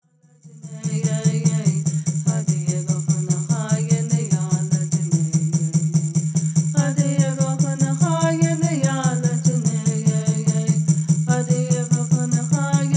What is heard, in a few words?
Peyote Song